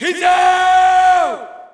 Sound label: Shout, audience; UFC Tapout 2